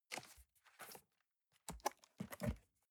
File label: cardboard box close fold